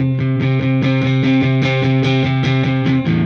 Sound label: Silos Dirty Strum Guitar, Indie Rock